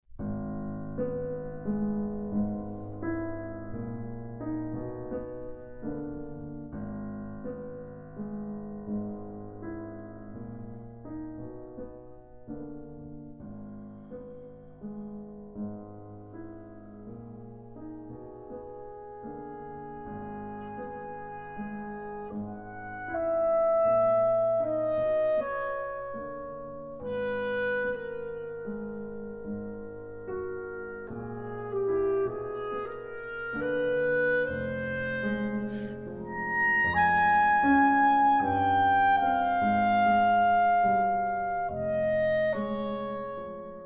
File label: Clarinets and Piano, Clarinet; Partita No. 4 for Clarinets and Piano; II. Songs Without Words